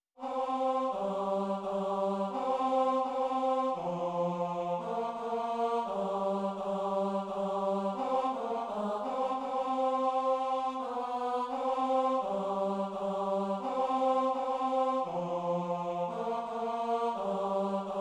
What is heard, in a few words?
tenor; zborove skladby; vianocne